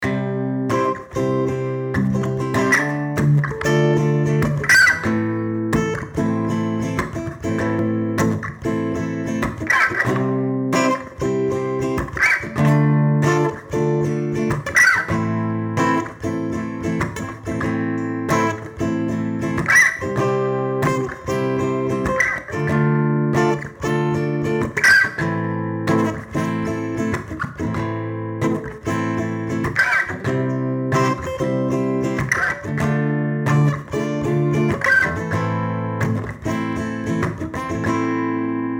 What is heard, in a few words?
C Dm Am 95 bpm